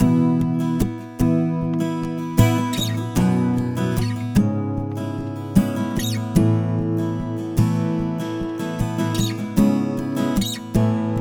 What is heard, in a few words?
Acoustic Guitar; Guitars